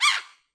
Bird White Damage; fowl

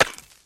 Ice Collision, Ice Blocks; fowl

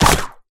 Easter Clover Destroyed; fowl; Easter Blocks

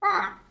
Bird Blue Talk; fowl

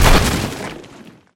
Stone Destroyed, Stone Blocks; fowl

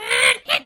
Bird Green Selected; fowl

